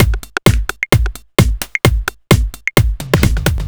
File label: Fill, Drum Loops 130bpm; Zero-G; Soundclash Nu Dance Electro; Acidized Wavs; Snap Crackle